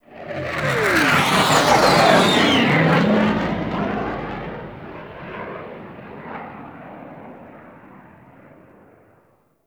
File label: Ship Fly By; Warframe; The New War; Plains Of Eidolon